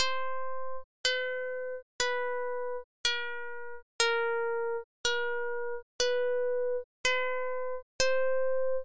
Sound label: quarter tone sketch; schoenberg; vienna